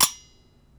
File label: Spring Loaded Blade; bb - Smol Mechanisms